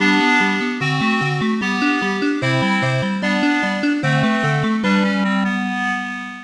Jingle Game Over

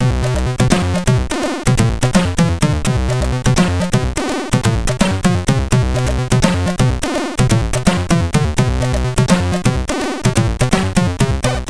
Game Over, SID Stereo, commodore 64; Rubicon

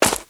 Steps; car simulator 2

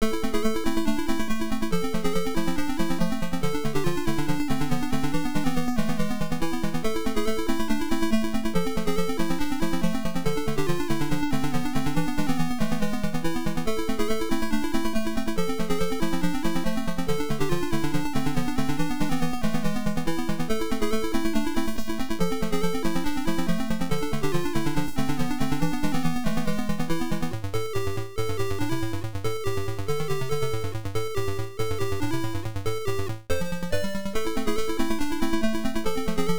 Low Energy BGM

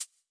tile

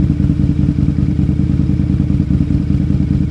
Lamborghini Aventador Idle; gamerip; Car Simulator 2; android

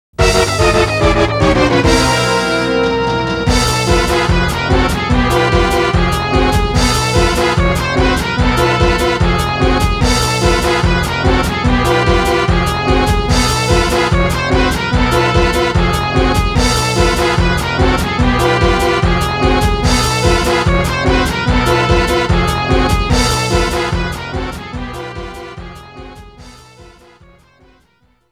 Losing Jingle; mario tennis 64 original sound track

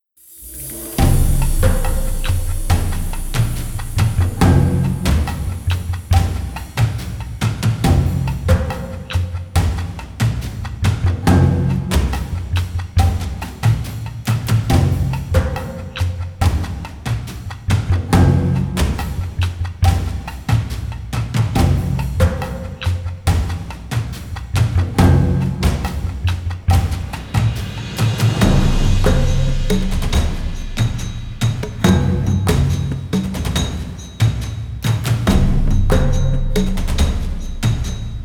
Fist Fight Loop

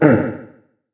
Turtle pain

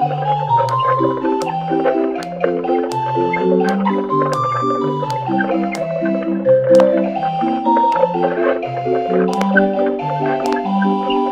Barrel Organ; My Tamagotchi Forever; android; GSFX Barrel Organ Kuchi

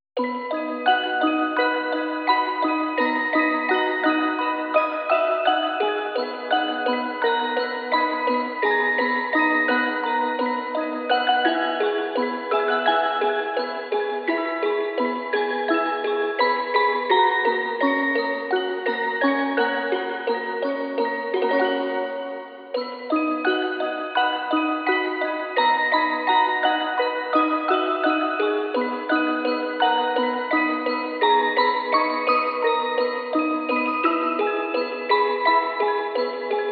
Bluebird in the Wind (Orgel ver.2); moecom moekko company original sound track

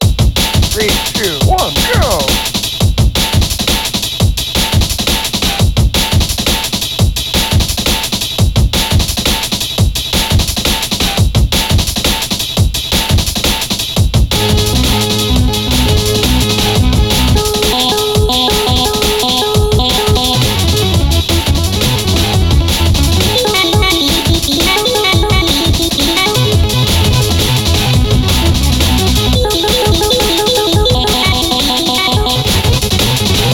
Randomly Generated Amen Break Song

